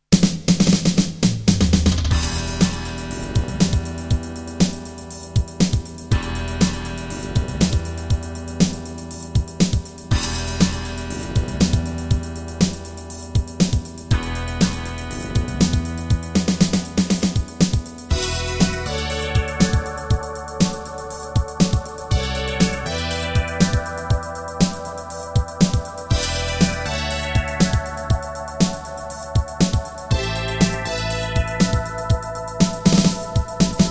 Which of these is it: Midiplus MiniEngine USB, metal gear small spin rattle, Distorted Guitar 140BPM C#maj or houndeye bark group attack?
Midiplus MiniEngine USB